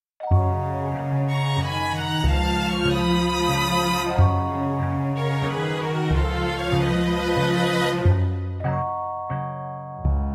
(Gb To G) No Backing Vocals

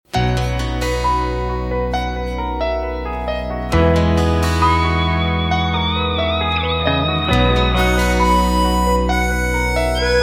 (B) No Backing Vocals

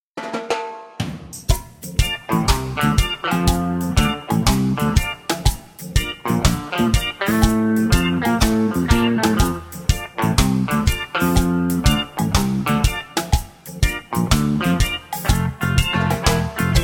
F No Backing Vocals, backing tracks

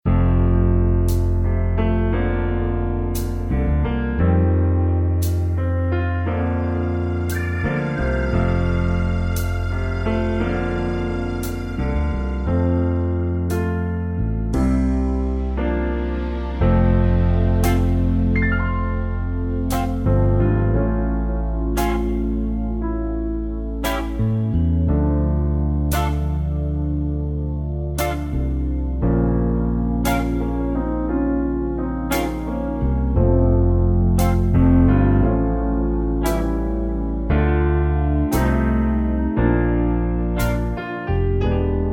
F To Gb No Backing Vocals, backing tracks

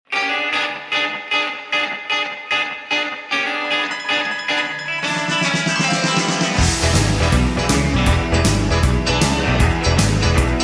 Rockabilly, No Backing Vocals

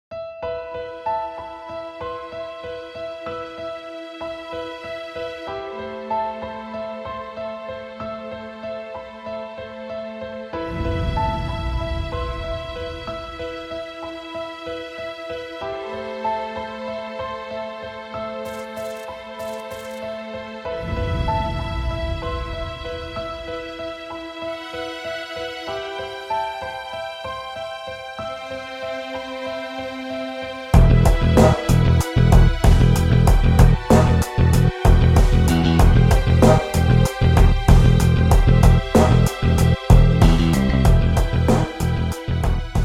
(Em) No Guitar No Backing Vocals

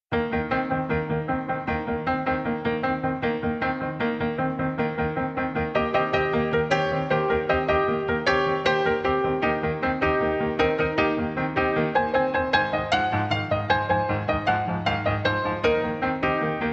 Eb No Guitar, backing tracks

